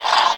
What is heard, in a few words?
Horse Caballo